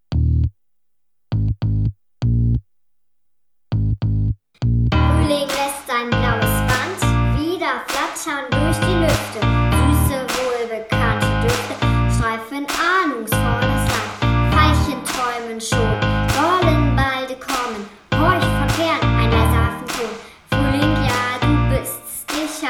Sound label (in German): Rap Delfinklasse